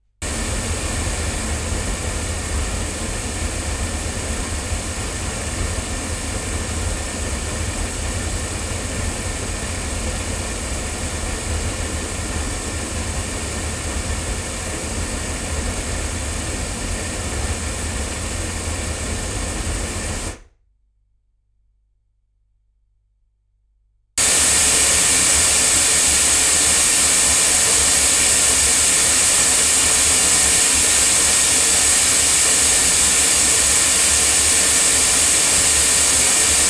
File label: omni loudspeakers; microphones; studio projects; SP LSD2